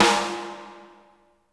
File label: AMBIENT A, Drumworks; Sampleheads; New York City Drumworks VOL-1